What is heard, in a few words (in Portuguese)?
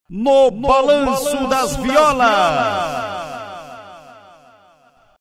vinheta no balanço das violas